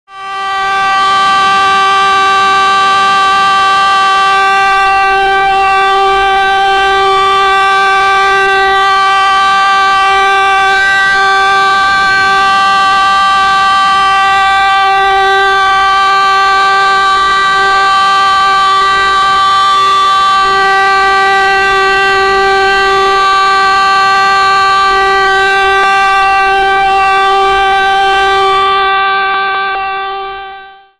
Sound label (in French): signal de fin d'alerte